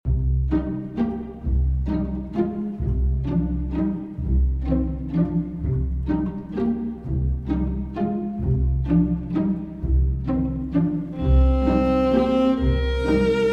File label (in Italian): Viola e pizzicati